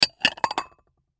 Bowls Stack Single Wobble Dishes; Dishware; Household; Blastwave

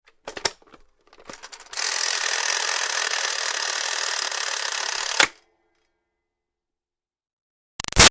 Toy Toaster Countdown Timer Tick; Plastic Toaster; Wind Up Toys

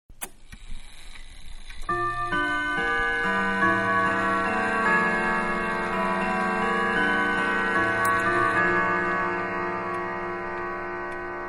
Grandfather Clock Tune 1, 12 Hour; Clocks (Sound Effects:Machines); Clock Grandfather-Tune 1, 12 Hour; Manhattan Production Music

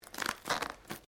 Branches Dead Strain

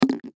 Lens Cleaner Set Down Plastic Bottle; Cleaning; Foley; Blastwave

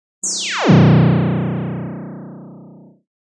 Zap; Zaps; Electronic

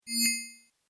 Tech rollover, Rollover: Short, High Tech Effect; Mouseovers; Multimedia

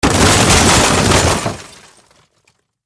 Impact Crash Heavy; Crashes

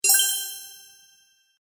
Fairy Sparkle, magic; Magic Sound Effects

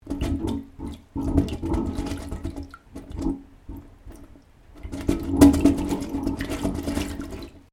Water Gurgling In Pipe; Weather

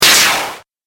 Game App Laser Shot, Video Game Sound Effects

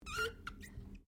Glass Squeak Rubber; Material components for constructing more complex sounds; Materials

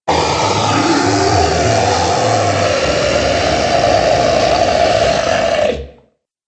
Twin Headed Dragon, Monster Sound Effects; horror

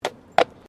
Tram Horse Drawn Horse Step Pavement; Horse drawn tramcar; Carriages; Vehicles

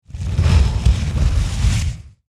Fire Flame Whoosh; Flames

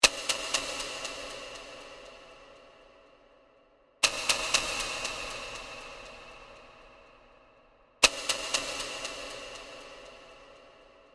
Bed Clock Ticks, Production, Element, Eerie; SoundEffects-BlastWave

